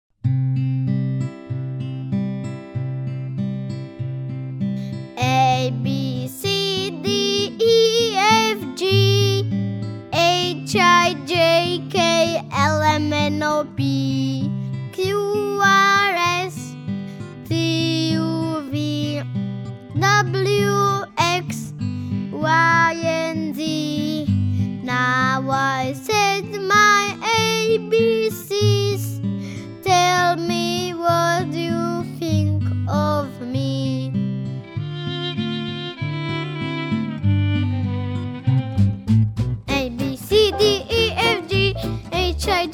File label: the alphabet song